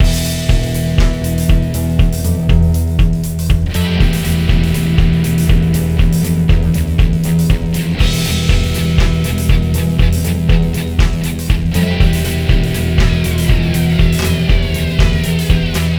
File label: Ditty; NBA Inside Drive 2003; Xbox